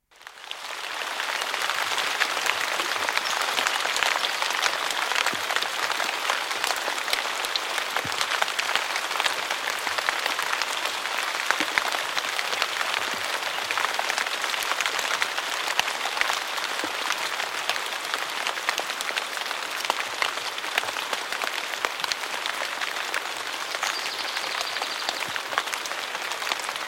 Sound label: rain; bbc; Nigel Tucker